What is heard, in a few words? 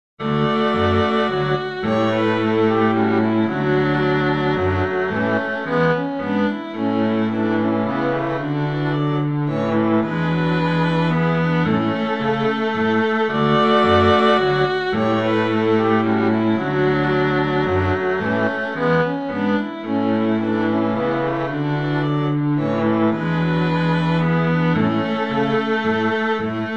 menuet